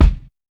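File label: bass drum, Drums Acoustic; AKAI; 90s sample CD; USB Soundscan vol.10; RAW KIT 8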